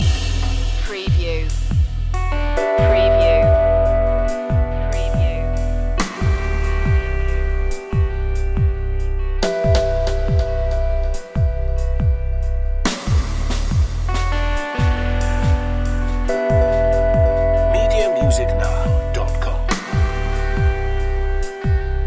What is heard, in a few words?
ethereal circuits loop, dark house